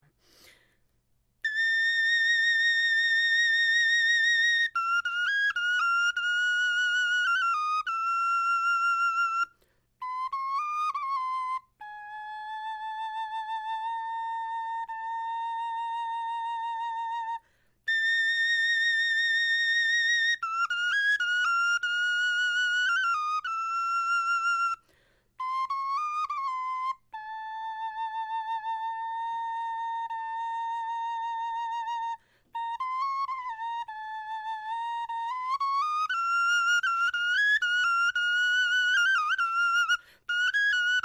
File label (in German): melodie